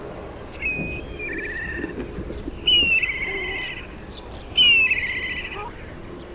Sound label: Black Kite